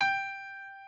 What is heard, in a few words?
piano